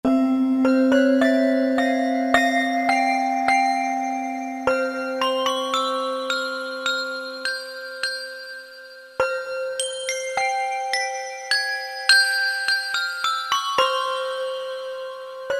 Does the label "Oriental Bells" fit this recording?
Yes